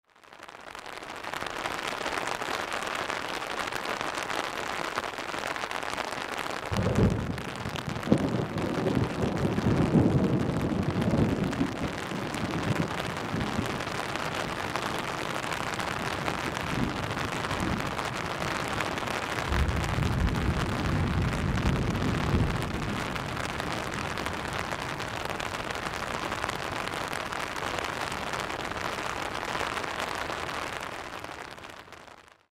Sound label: umbrella thunder